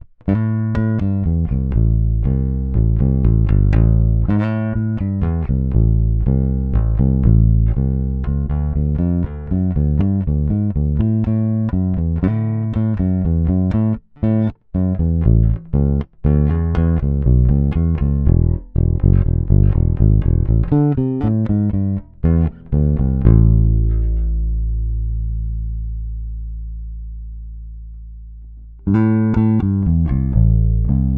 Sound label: Rock Finger, JBass Linear